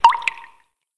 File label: Drip